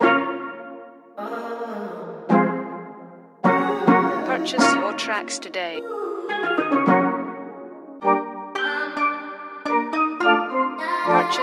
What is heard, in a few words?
Loop Kit; WavSupply; NoFuk; Lava Loop Kit